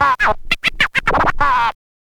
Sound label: scratch kit, vinyl